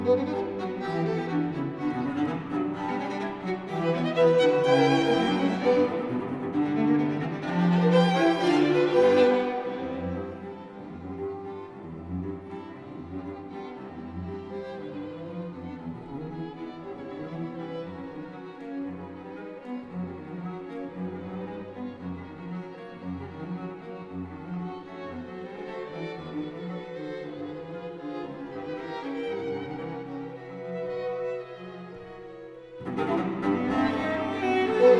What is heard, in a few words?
String Quartet in E Minor